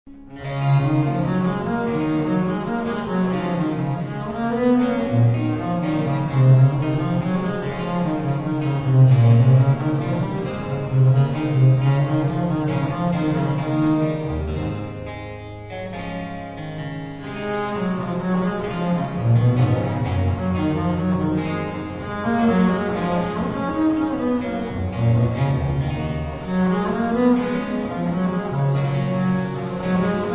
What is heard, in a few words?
aria violone; Buxtehude